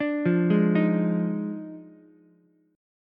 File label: D sharp Major 7th Suspended 2nd Suspended 4th, Ukulele; arpeggiated; JGuitar